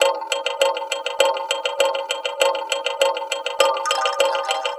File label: Mbira Echo, Acoustic Beats; Sonic Foundry (Sony Creative Software); Myths of Technology